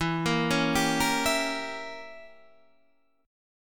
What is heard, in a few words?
E Diminished 7th Guitar Standard; Edim7 arpeggiated; JGuitar